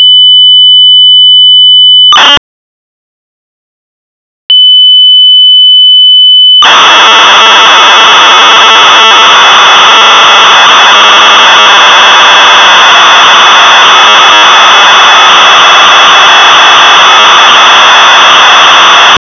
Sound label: CLAP